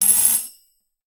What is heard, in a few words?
TAMBOURINES, Percussion